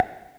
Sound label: spoken feedback toggle countdown low; accessibility; chromeos